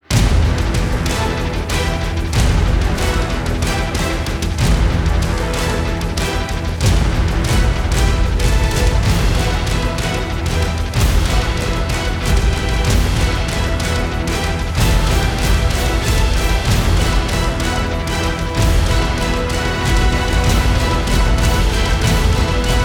No Choir, Epic Choral Action; трейлерная музыка

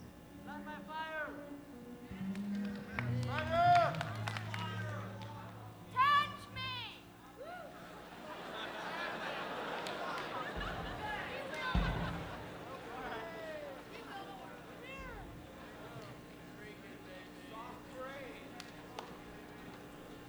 The Crowd Humbly Requests

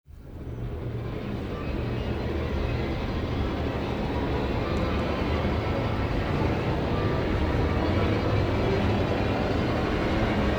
Orch Rise

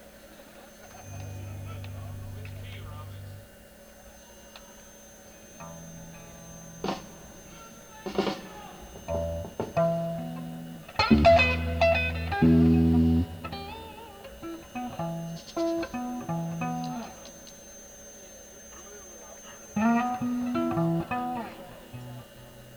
Tuning

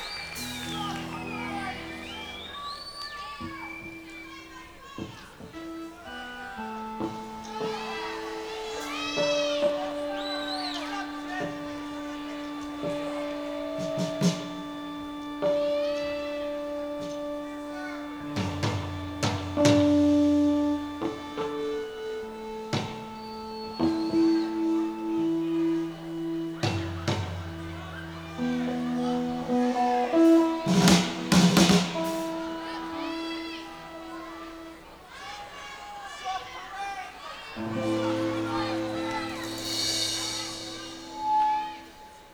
Tuning-Breather

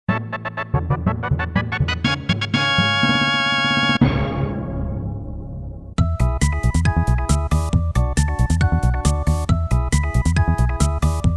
Fanfare

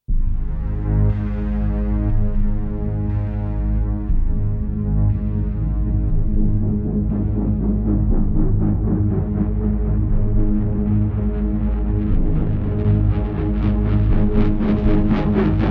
Grid No Drum; Sound Design

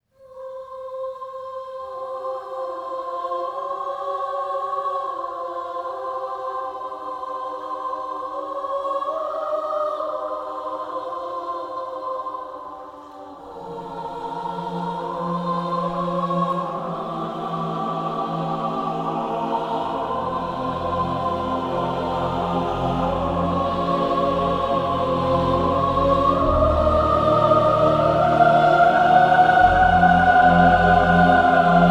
Hymn

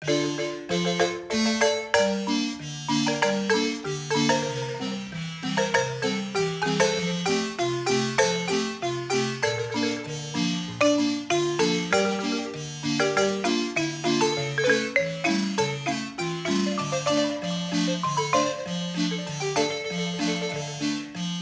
Instrumental - Marimba

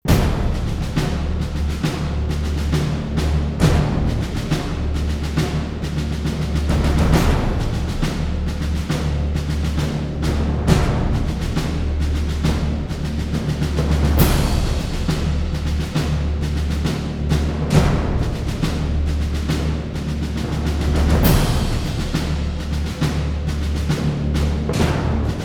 Drums, Orchestra and Choir; трейлерная музыка

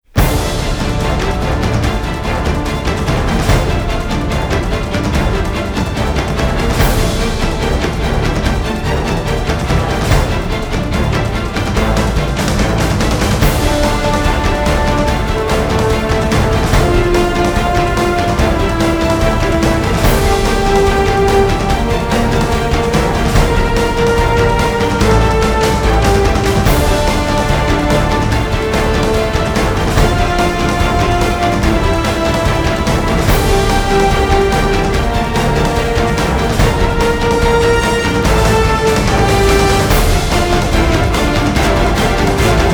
(No Choir), Orchestra

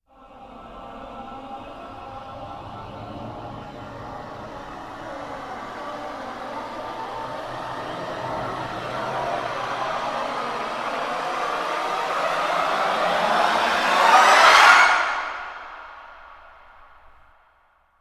Sixty Voices Rise

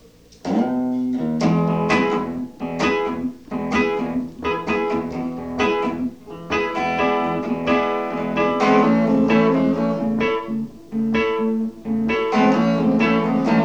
Reggae Jam

